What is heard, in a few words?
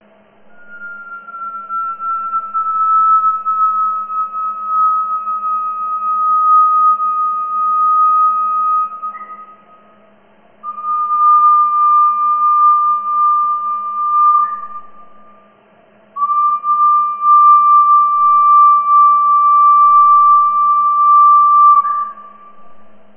rat